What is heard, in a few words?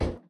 Metal Walk; Lethal Company